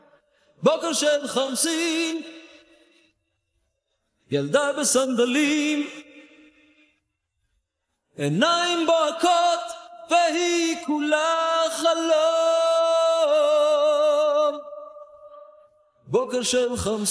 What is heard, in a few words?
Vocals